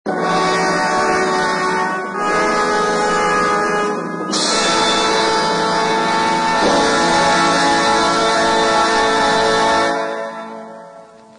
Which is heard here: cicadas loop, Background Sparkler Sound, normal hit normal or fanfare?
fanfare